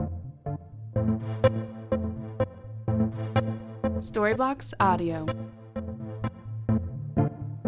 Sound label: Ambient House Arp 125BPM F